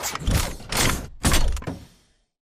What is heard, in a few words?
backpedal to combat; Titanfall 2